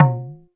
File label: Perc, Alesis DM5